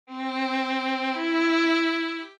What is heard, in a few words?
violin